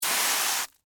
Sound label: Scrape Cereal Short, Texture, Scrape, Short, Cereal, Corn, Flakes; BlastWave